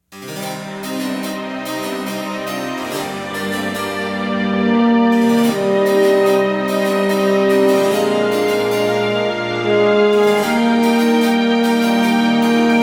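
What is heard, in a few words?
Campaign Music; heroes of might magic iii hd 2015 ios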